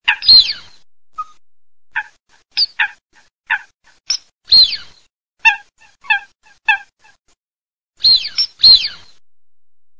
folaga fis maschio

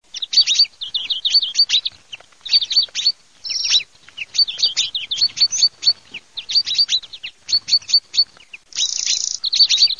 FRINGUELLO VERDONE, birdsound

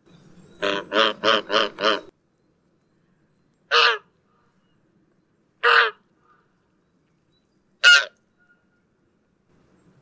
oca selvatica, birdsound

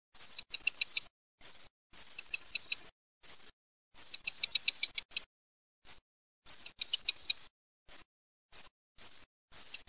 codirosso spazzacamino

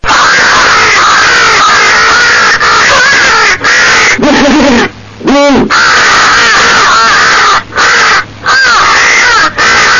CORNACCHIA LOTTA GUFO, birdsound

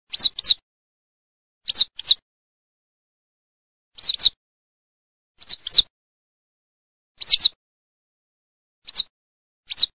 CUTRETTOLA, birdsound

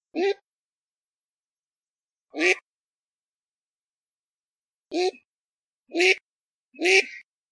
alzavola

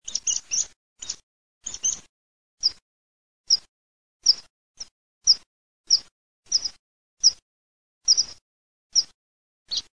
pispola

